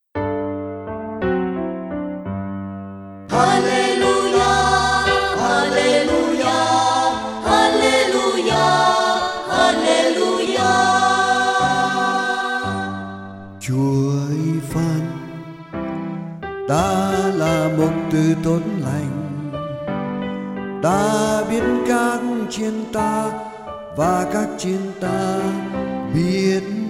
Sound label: alleluia song